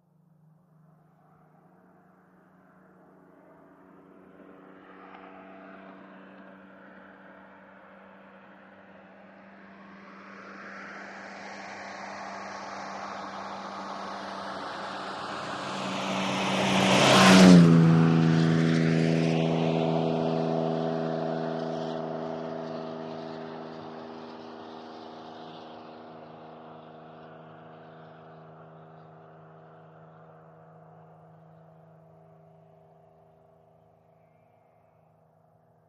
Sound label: VEHICLES ASTON MARTIN: EXT: Passing at high speed, utterly gorgeous, long fade.